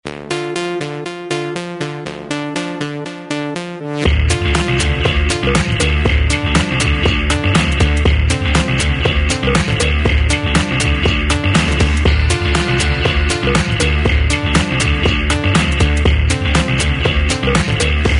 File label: Flamboy, Nokia 2760 Ringtones